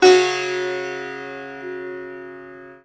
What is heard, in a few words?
SITAR CHORD; SITAR CHORDS